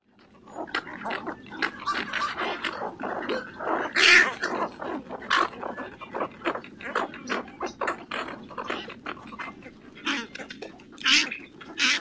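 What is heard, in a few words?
colony sounds, Cattle Egret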